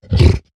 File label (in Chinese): 疣猪兽待机声